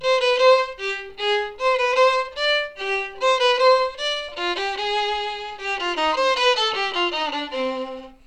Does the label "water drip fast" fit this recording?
No